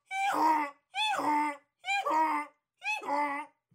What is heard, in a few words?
hee haw